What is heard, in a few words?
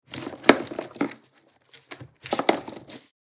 Picnic wicker basket unpacking food